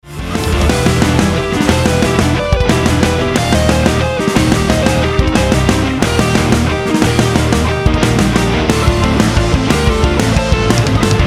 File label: chrom- med - bridge transition